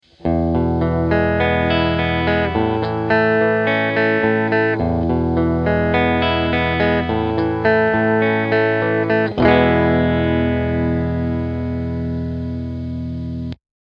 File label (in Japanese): アンプ直